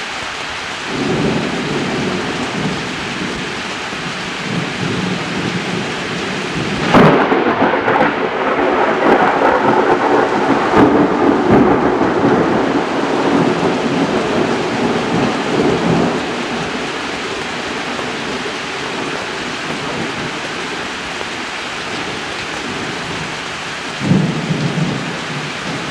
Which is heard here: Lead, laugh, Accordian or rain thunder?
rain thunder